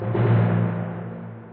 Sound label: Tymp